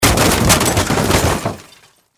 Impact Crash Heavy; Crashes

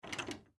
Bathroom Door, Door Lock Fast; Household; Blastwave

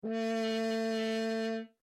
HORN A4 F LONG STOPPED, French Horn in F; Alexander French Horn in F; Horns (Sound Effects:Musical)

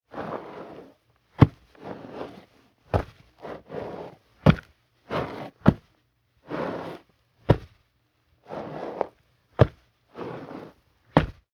Horror Monster Zombie Walk; Zombies (Sound Effects:Horror); SFX Bible 6

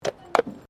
Tram Horse Drawn Horse Step Pavement; Horse drawn tramcar; Carriages; Vehicles

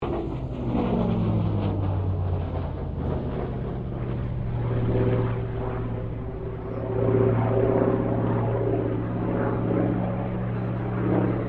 AIRCRAFT HAWKER SEAFURY PASS, fighter-bomber; Airplanes; Vehicles; Aircraft Collection; Twistedtracks

